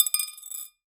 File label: Drop On Base, Glass Medium Wine Glass